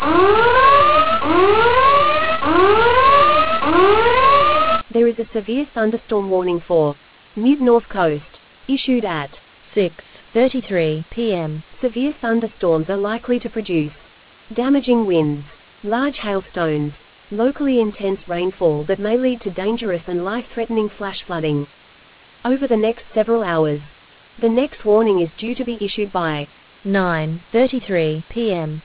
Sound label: storm audio